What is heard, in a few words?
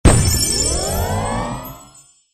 collect coin full